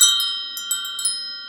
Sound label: open chimes; Quicken